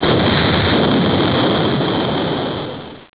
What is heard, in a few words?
Explosion; Deliantra